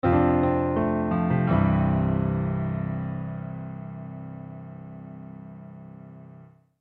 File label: Piano Accent Fill Warm; Pianos; Musical; Blastwave